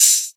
open hat a key, Royality free open hi hat one shot tuned to the A note. Loudest frequency: 7156Hz